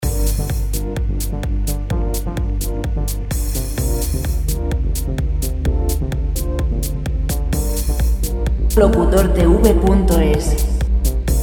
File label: 128 dance